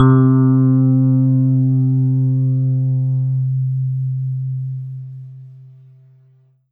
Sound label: Roland PN D10 Hammered E Piano C3